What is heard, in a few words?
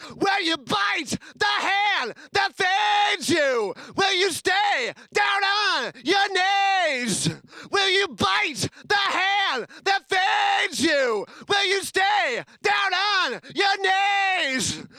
lead vox